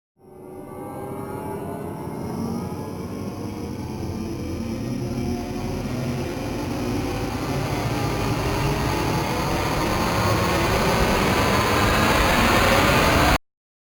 Rise Only; Ninja Tracks

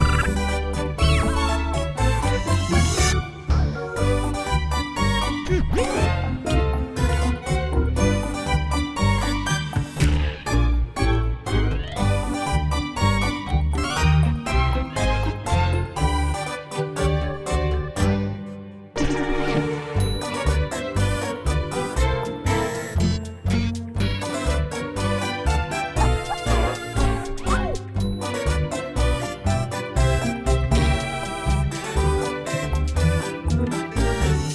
Witty Ambient

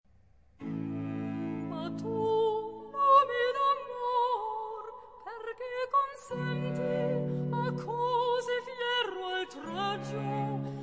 Recita, Cantata for Soprano solo; Che giova il sospirar; Recitativo

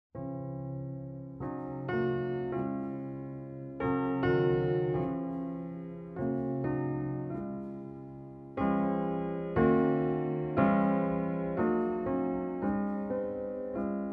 Christmas Hymn

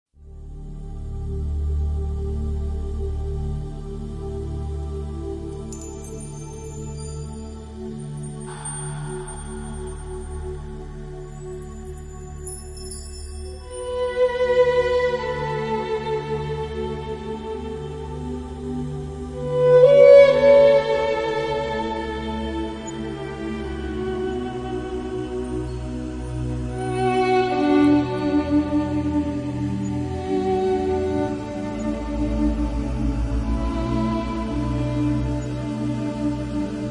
Voice Of The Waves, The Violin

